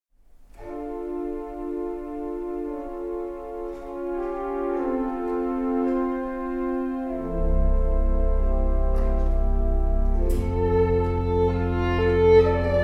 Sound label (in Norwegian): fiolin